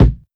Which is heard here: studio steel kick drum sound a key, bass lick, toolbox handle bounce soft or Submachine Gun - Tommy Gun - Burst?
studio steel kick drum sound a key